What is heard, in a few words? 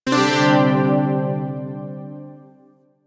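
Shawzin Pentatonic Major Chord; Zariman Shawzin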